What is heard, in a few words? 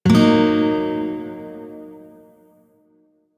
Shawzin Blues Chord; Warframe; Lotus Shawzin